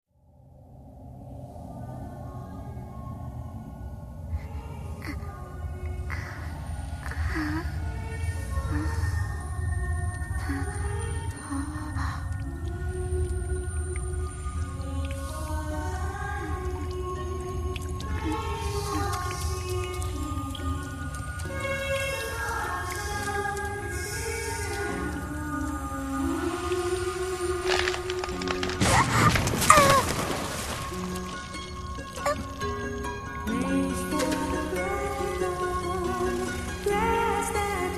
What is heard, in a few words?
chant en